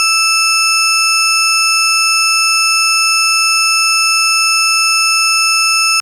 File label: FM Sawtooth DX100 E6